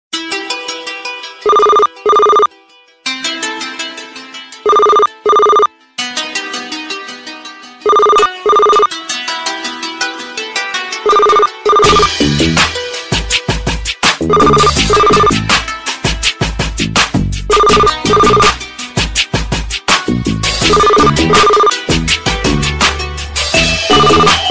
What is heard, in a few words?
cell ringing